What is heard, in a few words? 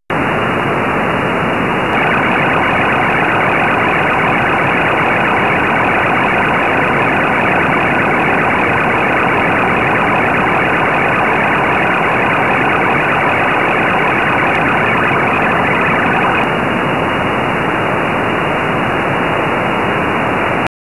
ALE test anycall